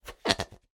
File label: cartoon whoopee cushion air release accident